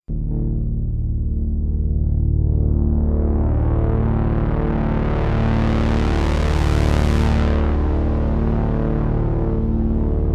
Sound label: Enhancia Neova Filter Pad